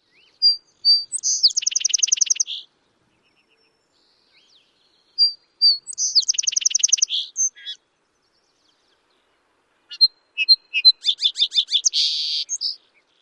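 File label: SONSPA songs num 3, song sparrow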